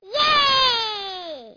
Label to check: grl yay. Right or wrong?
Right